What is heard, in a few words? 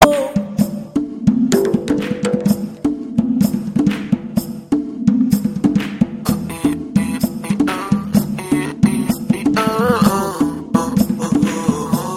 Tabla; Raavan